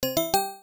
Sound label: Server Connected; Mumble